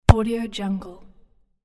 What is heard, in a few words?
football kicked